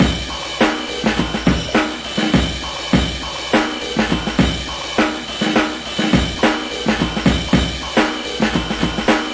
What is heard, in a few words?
amenesque ramble, 103 bpm, drum loop; 101 to 110 bpm